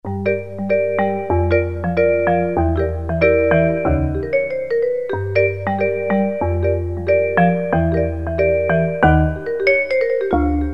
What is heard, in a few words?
Vibes; Generalmusic pRP8; Preset demos